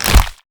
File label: Enter